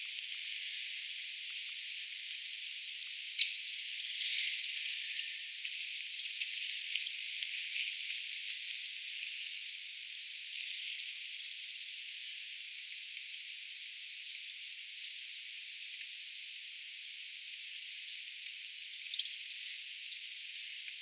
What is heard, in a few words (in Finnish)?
keltasirkkujen aantelya